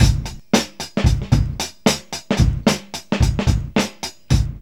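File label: 112 bpm, Funky loops; Zero-G; Funky Elements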